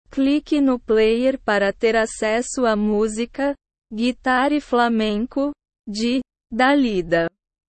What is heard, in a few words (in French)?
guitare flamenco